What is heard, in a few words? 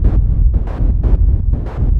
FUZZFACE, arpeggios; ILIO; Trance Fusion